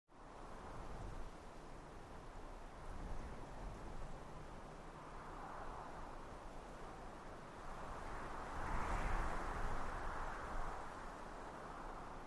Gentle Prairie Wind

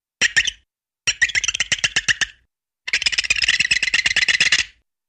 Marmot Or Small Animal; animals

